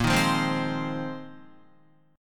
Bb 9th Suspended 4th Bb down Guitar 4F,4A,5C,5G,5C,6E; strummed; JGuitar